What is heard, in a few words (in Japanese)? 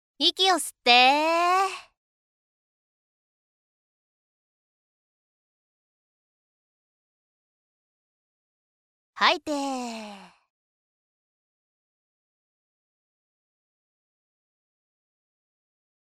息の吸い吐きロング